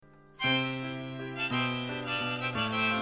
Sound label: Harmonica SAD